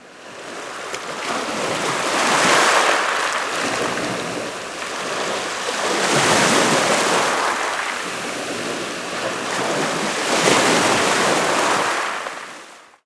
SEA, SEA WATER; Best Service - XXL Production Effects

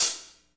HH hh; Best Service Gigapack 2

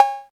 808 BELL; East West - Phat & Phunky CD2; 90's Sample CDs

